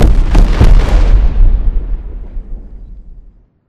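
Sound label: explosion distant kaboom; corporate; Titanfall 2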